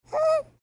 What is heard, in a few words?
squeek